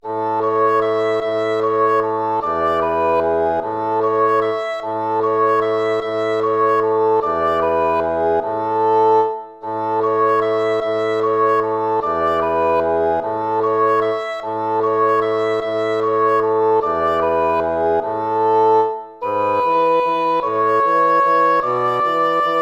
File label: waltz; Carulli Ferdinando